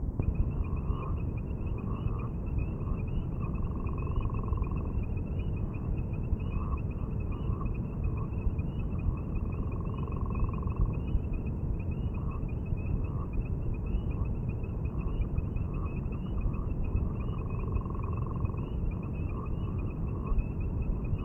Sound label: Gopher Frog Calls